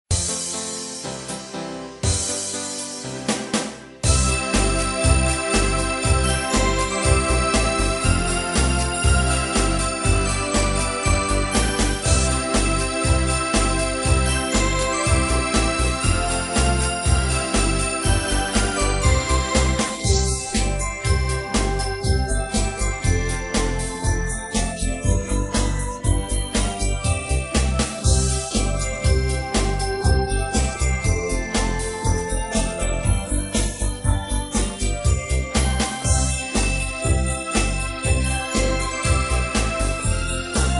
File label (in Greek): τρίγωνα κάλαντα